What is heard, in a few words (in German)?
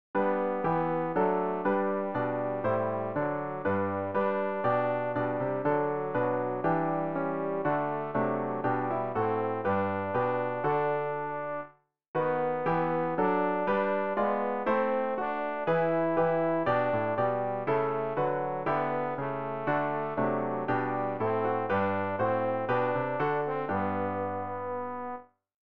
tenor; Ref. Gesangbuch; RG 676: In allen meinen Taten